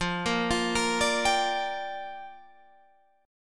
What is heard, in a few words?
G Minor 7th, F